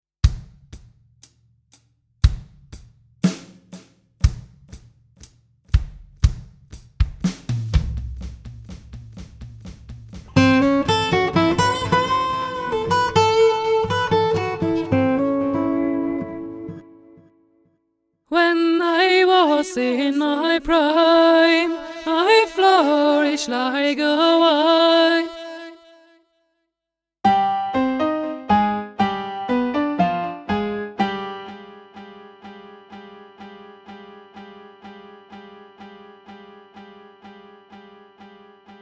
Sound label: RMX16 Delay